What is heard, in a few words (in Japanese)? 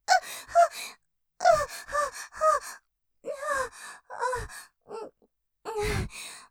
絶頂余韻 吐息多めに; ステレオ; 目を白黒させて吐息多めに; ループで楽しむ！シェフの気まぐれ抜き抜きコース